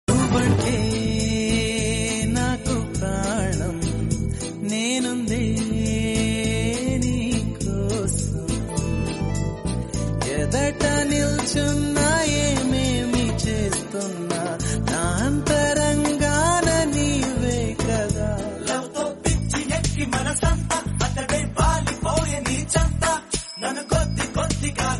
Romantic Telugu Song Ringtone

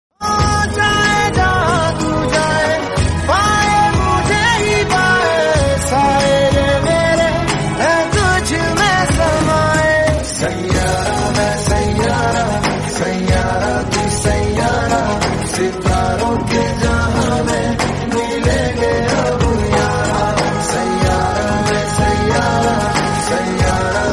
Hindi Song; Ek Tha Tiger

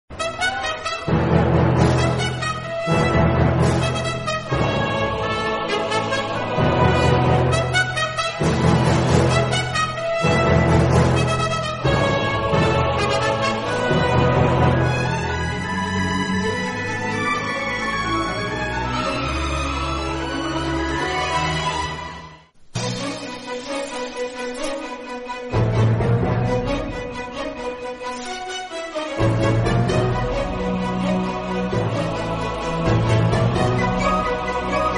Instrumental Ringtone

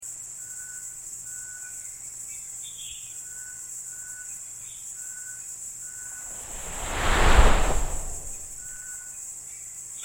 Jungle Vibes Ringtone

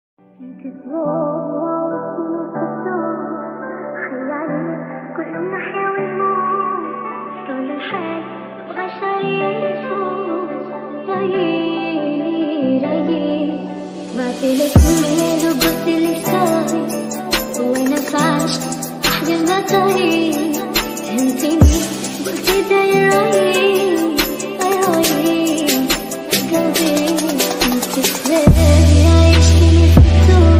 Heart Touching Islamic Ringtone

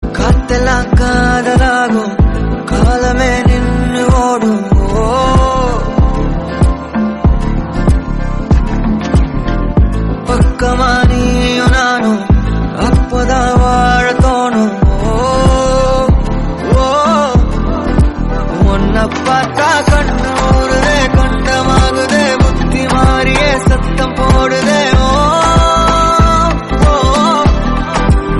Tamil Song; Jawan

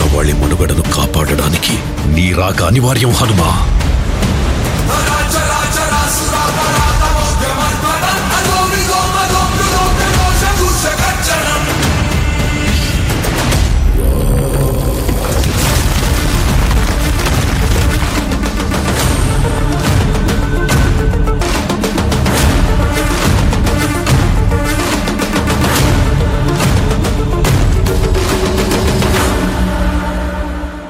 Movie Interval Bgm Ringtone; Hanuman

